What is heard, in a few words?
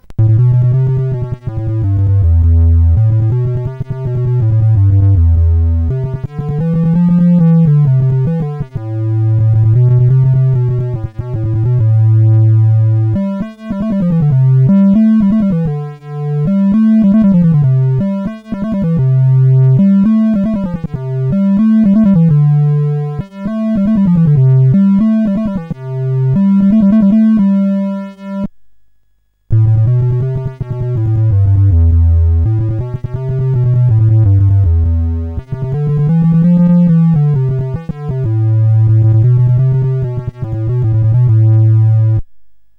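Pirati, MOS 8580 SID chip music, games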